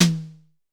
Drum X Snare; Pearl Drum-X

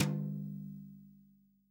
TOM, REAL DRUMS, SWING KIT

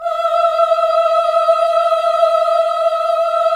VIB F E5B -R, Symphony of Voices; Spectrasonics

